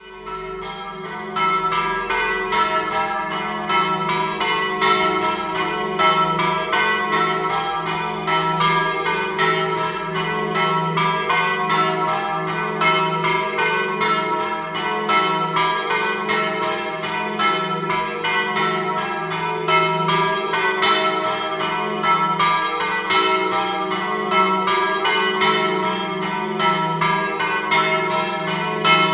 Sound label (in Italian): Tesis, campane